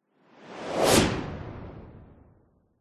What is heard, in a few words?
sound design fast whoosh; zapsplat